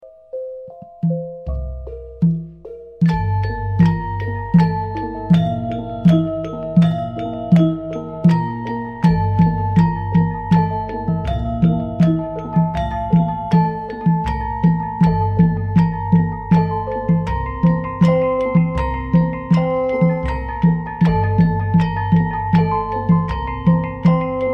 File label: gamelan